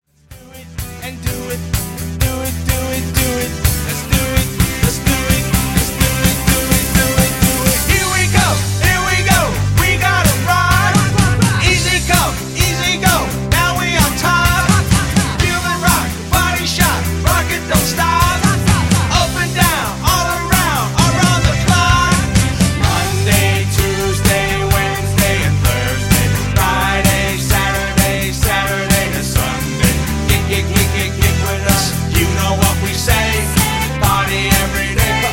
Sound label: Cover Band; Seattle